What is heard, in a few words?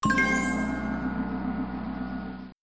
gong game complete level up